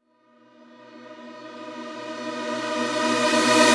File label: Reverse Synths Bmaj; Effects; Vengeance; Essential Clubsounds Vol.4